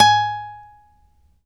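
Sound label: acoustic finger G#4, guitar; East West; Steve Stevens Guitar Samples Collection; ACOUSTIC FNG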